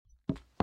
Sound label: footsteps